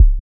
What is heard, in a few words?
Kick Sylenth1 C